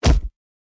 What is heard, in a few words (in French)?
Coup de poing